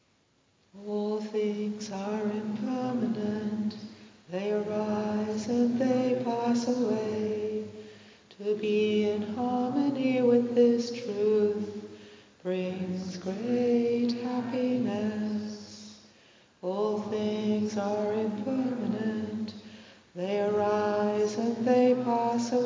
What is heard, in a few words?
Impermanence Chant English